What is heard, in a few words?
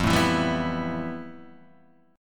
F Major Sharp 11th Guitar DADGAD, chord